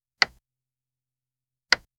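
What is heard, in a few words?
soft hover on button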